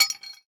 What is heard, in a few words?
Physics Object Wrench Impact